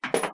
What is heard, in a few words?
Bullet Shells Thrown In Basket